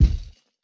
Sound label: Footstep; Baron